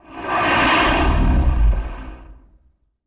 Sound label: dinosaur